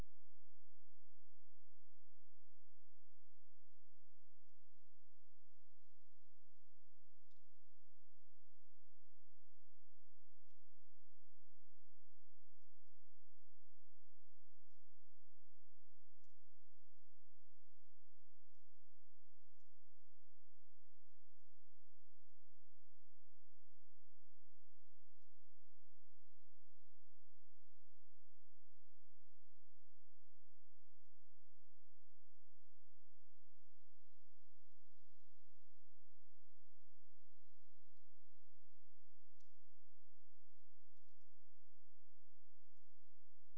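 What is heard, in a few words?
hydrophone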